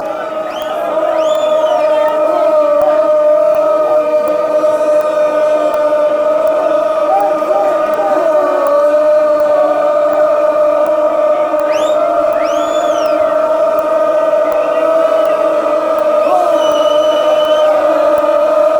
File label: funny goal kick